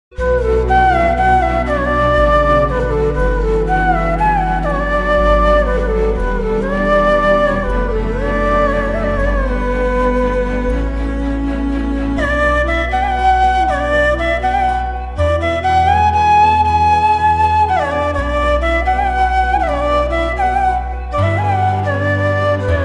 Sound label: flute; jaanu movie